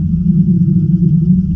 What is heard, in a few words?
ambience